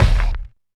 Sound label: DM5 Kick, Alesis DM5 drum machine